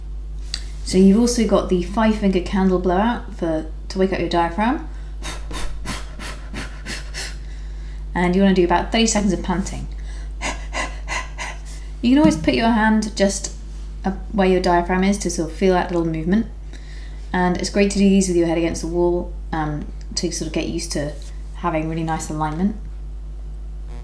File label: Five finger candle blowout and panting